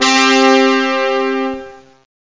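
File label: digital brass, keyboards; Zoom - Release 2; Active Software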